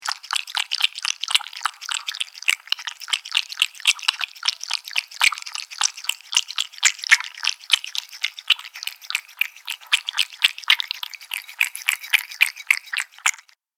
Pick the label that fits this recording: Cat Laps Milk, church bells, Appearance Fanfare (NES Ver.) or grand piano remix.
Cat Laps Milk